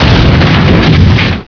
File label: crunch; Millennium Gold 2000; Monster